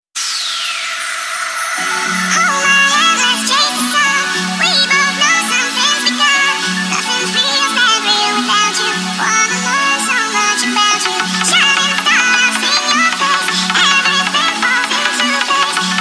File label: annoying ringtone rave drops; lolicore